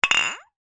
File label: vodka bottle hit against glass; food drink